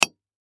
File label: Impact Axe Miners Pick Into Rock; Axes; Sound Effects:Tools; Blastwave